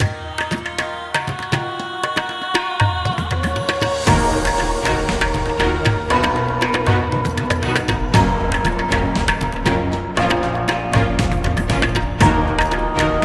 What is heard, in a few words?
Motivational Inspiring India